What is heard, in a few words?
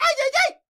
medium win grito; colossal pinatas